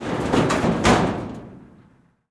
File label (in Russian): garaj vorota closed; FEAR